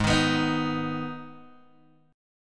Ab Minor Ab down Guitar Open G

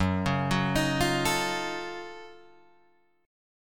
Gb Minor Major 13th Gb down Guitar DADGAD

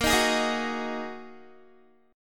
Bb 7th Suspended 4th Sharp 5th, Guitar; strummed; 18,18,18,20,18,x; 4E,4A,5D,5G,5C,6F; Bb7sus4#5

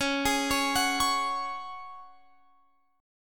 C sharp Suspended 4th, Guitar Open F; C#sus4; arpeggiated; x,20,20,20,21,20

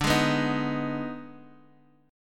Eb Major 7th Suspended 4th Sharp 5th D

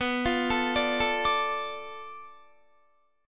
B-Minor 7th Flat 5th B down Guitar; Bm7b5 arpeggiated

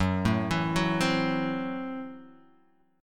Gb Minor 7th Flat 5th, Guitar Half Step Down

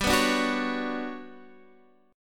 G Major Sharp 11th, Guitar, Drop D; chordshape; down

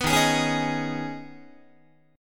D sharp 7th Suspended 4th Sharp 5th, Guitar; D#7sus4#5; strummed

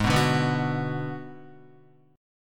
G sharp Minor 7th Flat 5th G sharp down Guitar; G#m7b5 strummed; JGuitar

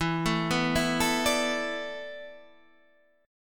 E 7th Suspended 4th

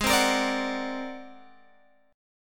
G sharp 9th Flat 5th G sharp Guitar Open G

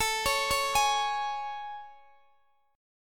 A Major 7th A, Guitar, Open D

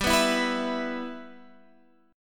G 6th, Guitar, Drop D

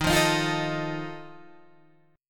Eb Suspended 2nd Flat 5th Eb Guitar Open G

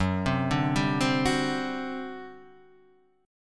G Major 7th Suspended 4th, Gb

